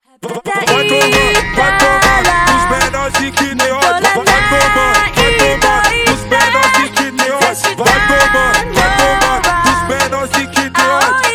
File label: Funk slowed